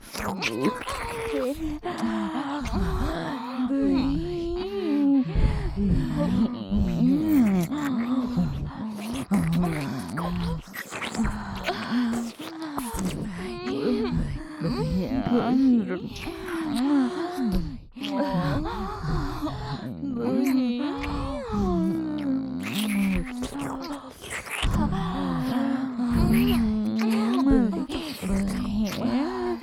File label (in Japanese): ラブラブキス＋ブタ媚び耳舐め